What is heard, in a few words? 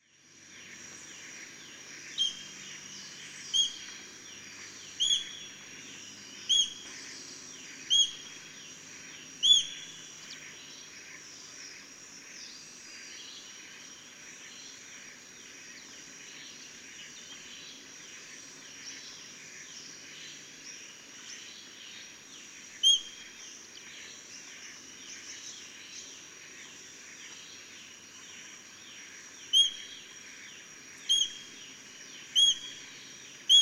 Nisaetus nanus, Kleine kuifarend; roofvogels; Accipitrinae; Accipitres; Accipitidae